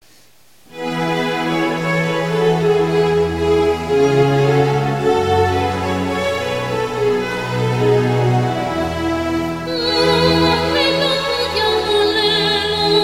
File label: alleluya violin